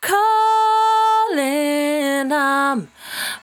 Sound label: 68bpm D, Velvet Vocal; Zero-G